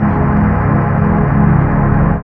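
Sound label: synth pad; E-Lab; X Static Goldmine 1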